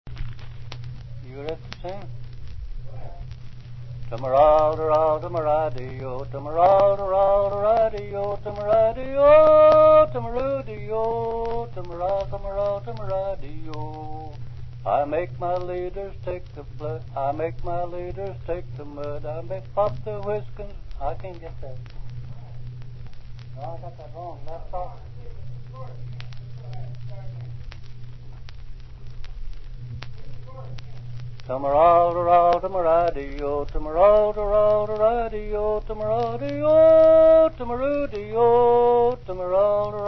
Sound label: ox driving song; lomax